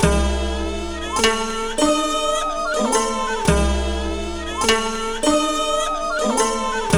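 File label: china orchestra; Zero G - Ethnic; CHINA ORCHS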